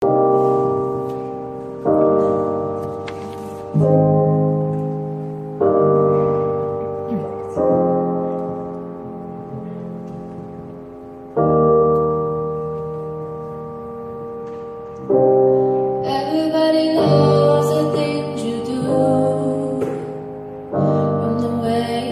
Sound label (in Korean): 호주여 노래